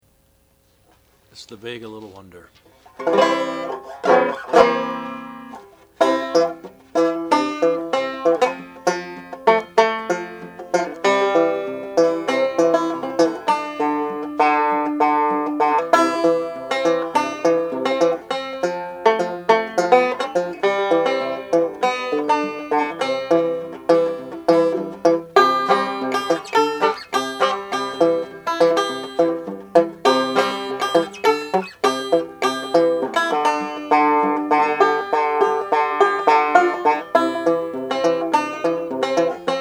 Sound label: vega little wonder guitar natural